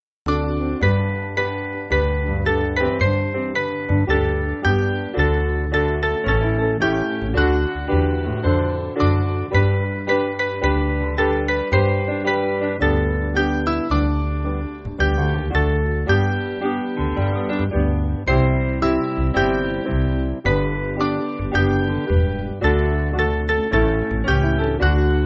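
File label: Piano, Music Backing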